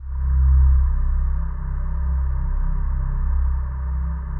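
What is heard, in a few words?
PAD, atmospheric pads; Best Service; XX Large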